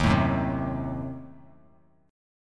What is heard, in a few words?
D sharp Diminished 7th D sharp, Bass Standard; D#dim7 strummed; 11,12,4,5; JGuitar